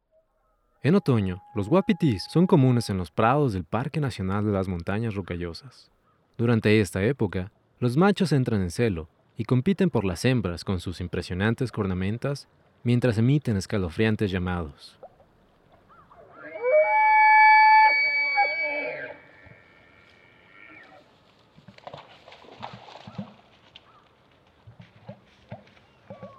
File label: Elk; sound and light ecology team